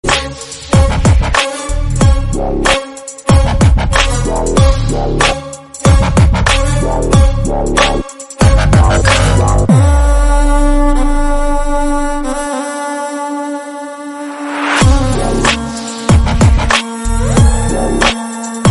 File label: Bgm Ringtone; ringtones; Jawan